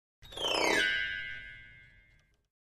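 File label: Grand Piano Strings High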